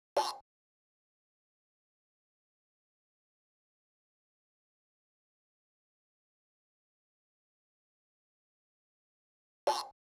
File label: Beat Starter Perc